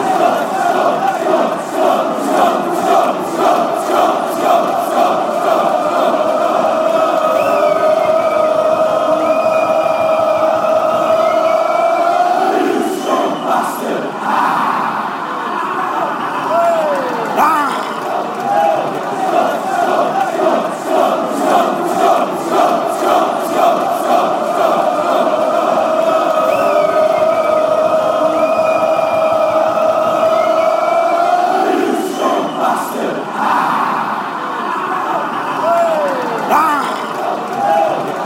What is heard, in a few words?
you scum bastard goal kick fanchants